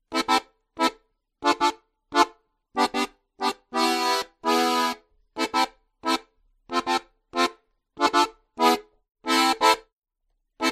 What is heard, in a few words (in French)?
ballet diato